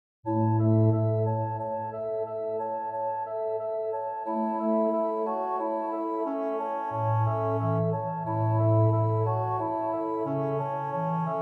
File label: organ transcription; gruber franz xaver; stille nacht heilige nacht weihnachstraum